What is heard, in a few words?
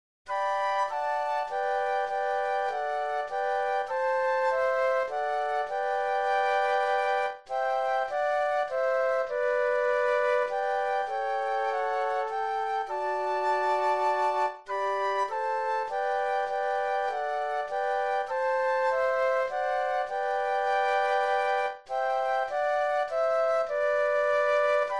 Flute Trio